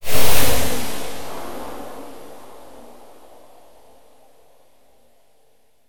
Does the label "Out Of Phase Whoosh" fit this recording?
Yes